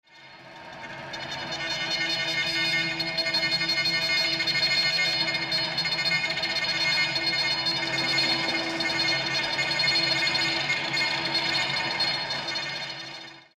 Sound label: trill